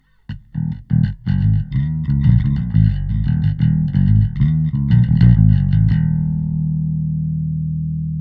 bass Bass Power; Nanopulse

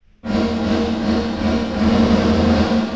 LATE REVERB Frequency Decay

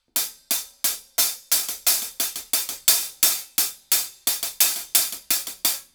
hihat Drums HiHat; smart:comp 2

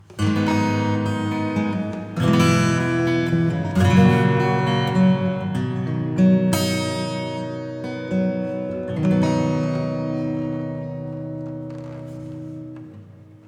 Plate Reverb acoustic

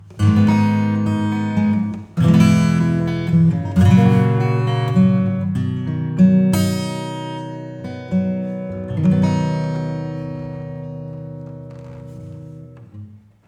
Acoustic; Reverb Compare; XenoVerb

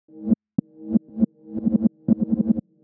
WET 0.00dB LP 200Hz

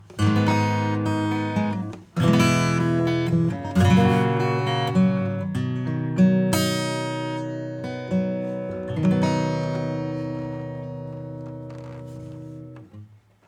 Acoustic

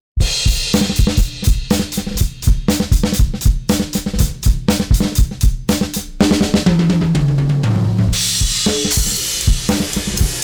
drums

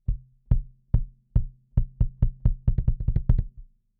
kick; Gem EQP

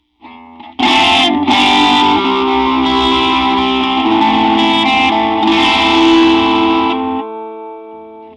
Acoustic Phaser; Moogerfooger MF-103S

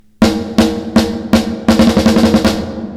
Snare, plate

